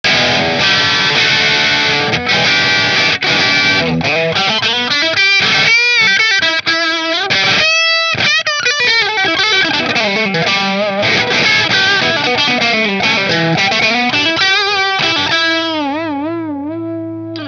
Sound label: Music Man JP bridge marshall; 2002 Music Man JP-6 Mystic Dream